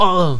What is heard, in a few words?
pain; player